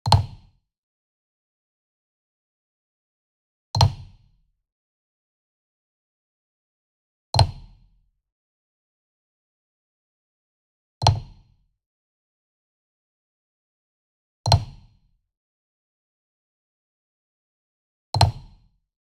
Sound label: Wood Percussion Wood Block Traditional Small Resonant Flam Near; boom library; Cinematic Strikes Construction Kit